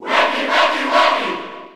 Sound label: Cheer Spanish; Wendy; SSB4